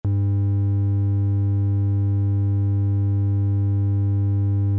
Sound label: Sine